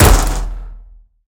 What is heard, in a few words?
Shut Door; game audio; Mita; MiSide